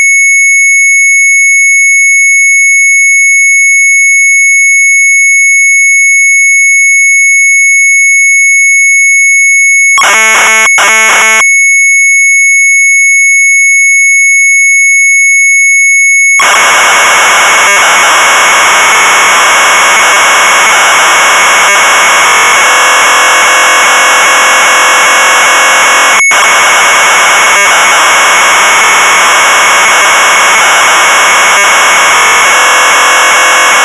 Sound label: MZ800 Tape; AUTO RUN; retromelsarchive; sharp mz800